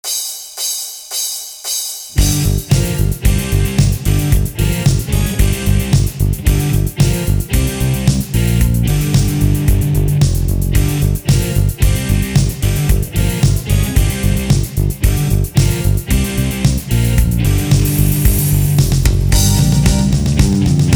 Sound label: SMOKE BACKING